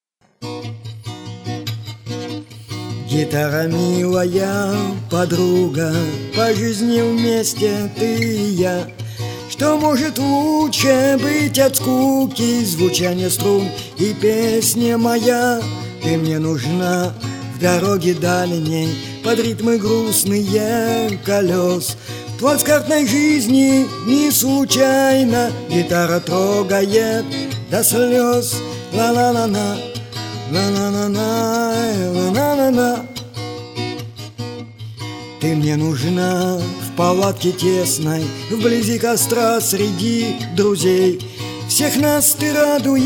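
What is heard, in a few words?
guitar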